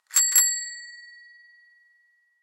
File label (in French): Sonnette de velo